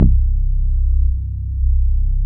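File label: wave bass C1, analog bass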